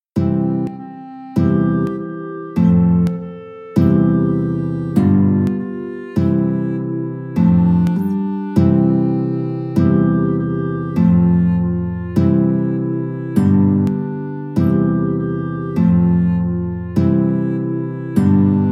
clarinet guitar duet; twinkle twinkle

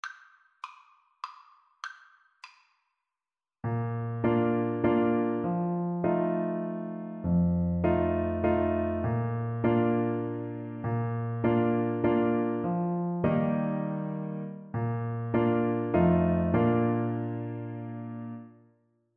Tenor Saxophone

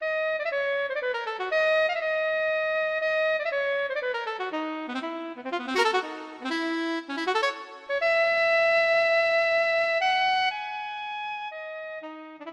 debussy syrinx, saxophone

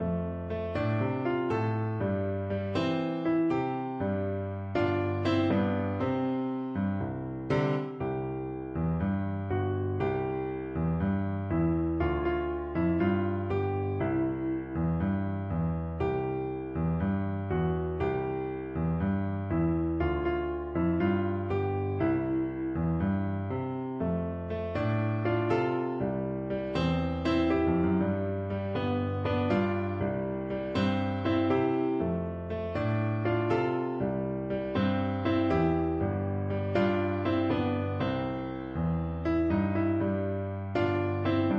EASY, piano

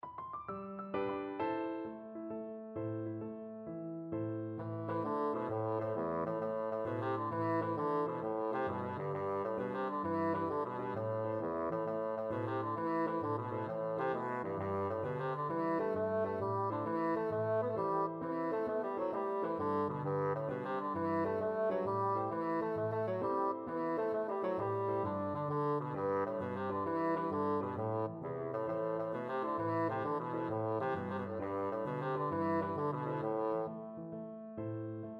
rocky road, bassoon

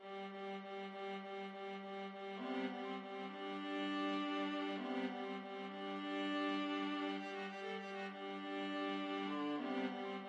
viola duet; parkgate high

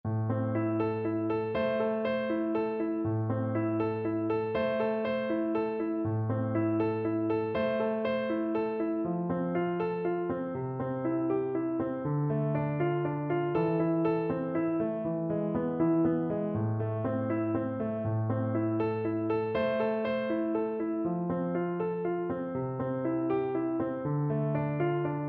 Vltava FL kar, Flute

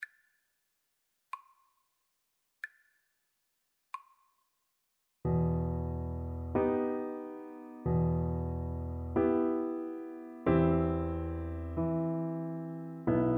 vesti la giubba, Viola

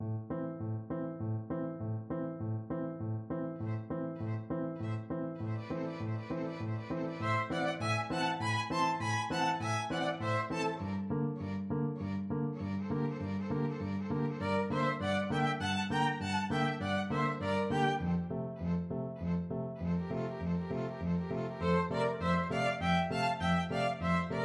chanson boheme violin duet

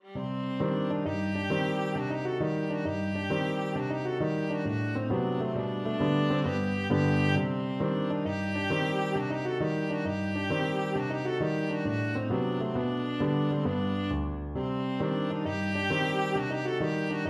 kolo, viola

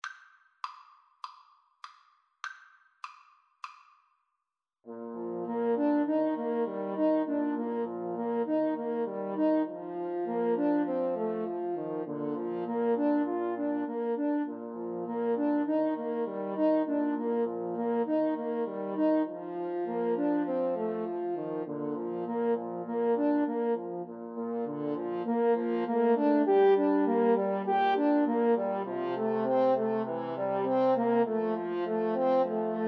blue bells scotland, French Horn Trio

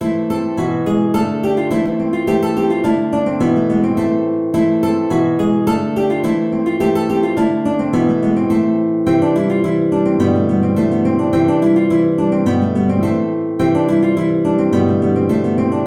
Morris 2 GTR

